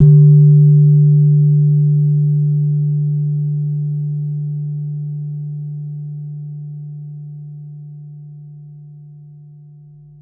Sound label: Slenthem, Gamelan